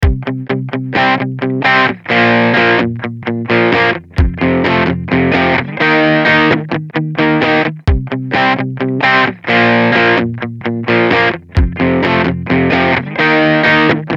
Blues

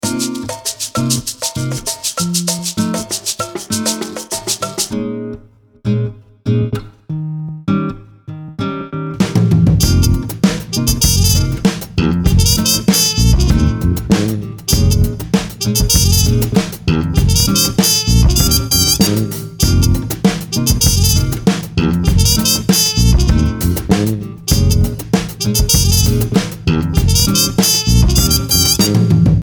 Cuban Soul

